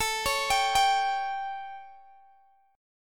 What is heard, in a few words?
A 7th Guitar Open G, chord; x,x,19,18,20,17